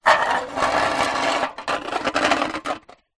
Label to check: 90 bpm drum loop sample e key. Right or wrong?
Wrong